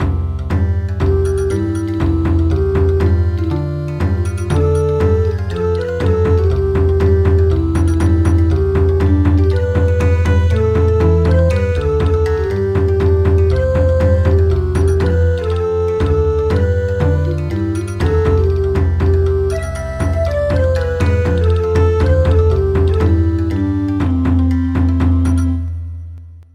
Bon Odori

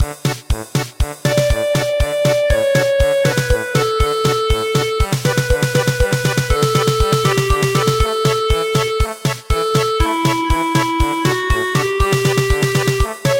German Polka, midi

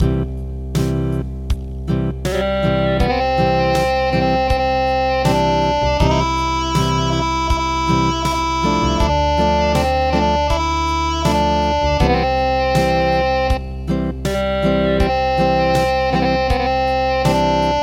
Acoustic, midi

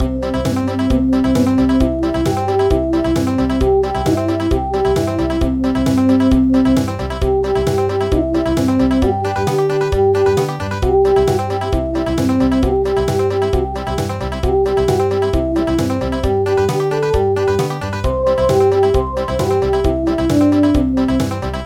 Country; Gom se mali

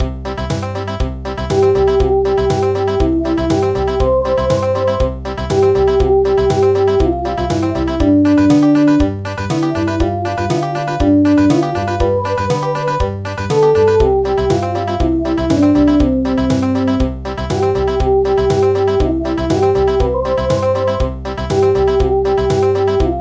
Country; Clap hands with the ladybugs

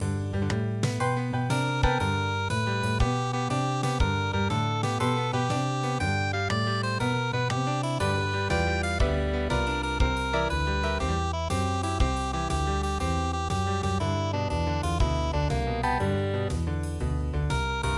harmonica blues; midi traditional

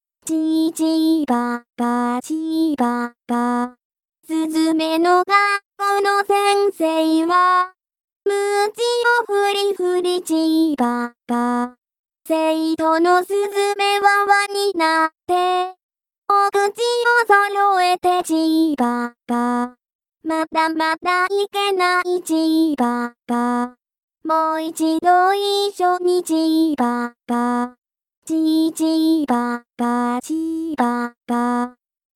suzume voice, traditional; sparrow school

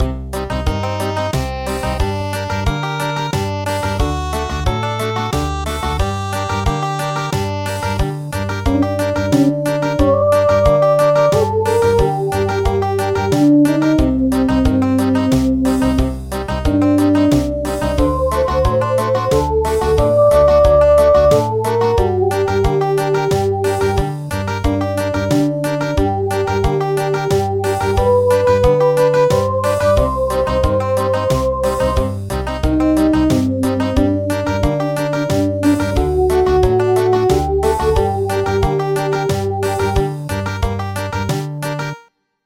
Country; Dandansoy

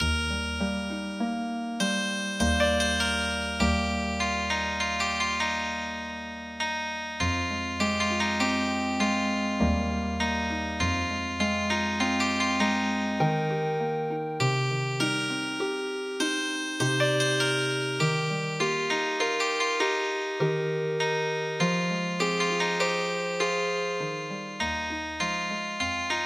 Healing Harp; geom jil mae gi

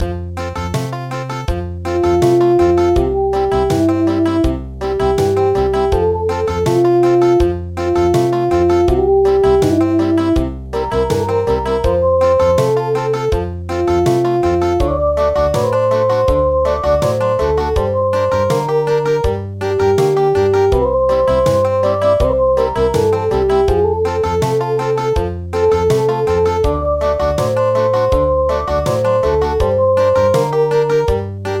Country

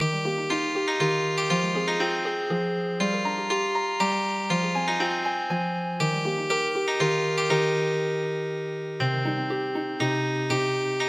gayaeum ta ryeong Healing Harp

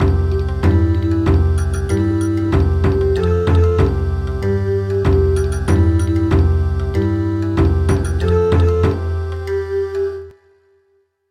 Bon Odori

